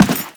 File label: run default, footsteps; Warframe; Teshin